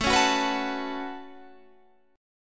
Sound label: A 6th Add 9th A, guitar chord